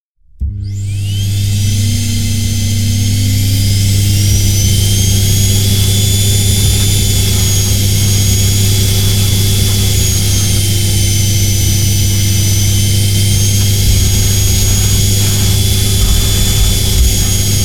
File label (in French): aspirateur